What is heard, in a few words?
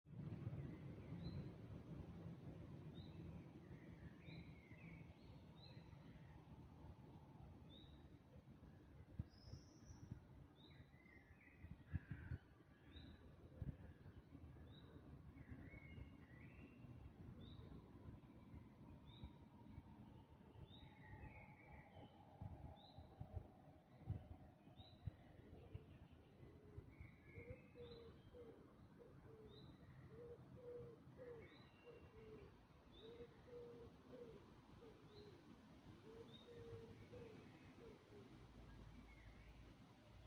Dawn Chorus; Der Dawn Chorus 2025 fand wie jedes Jahr im Mai statt